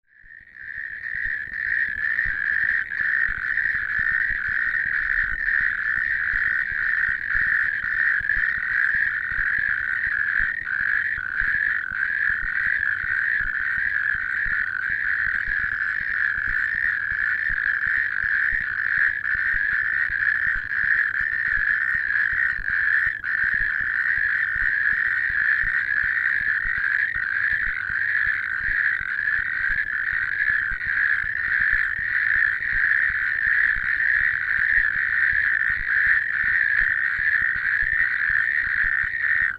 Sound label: Epidalea calamita